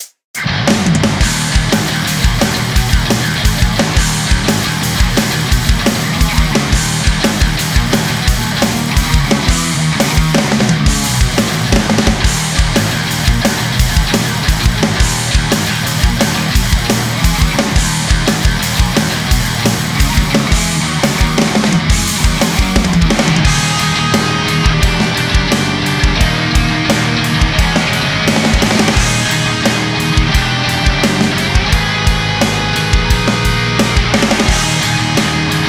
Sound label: Power Drumkit; Free Drums Shootout MT